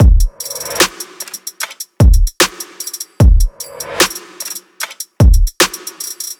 150 drum loop solid; TYNAN

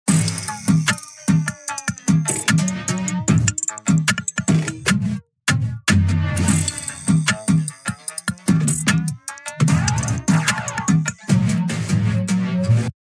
junkyard

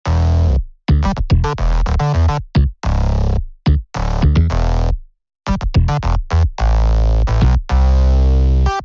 15 love bass

